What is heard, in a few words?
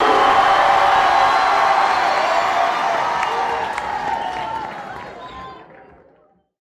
Short Aplause Mix